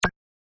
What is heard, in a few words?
nav roll; Wreck-It Ralph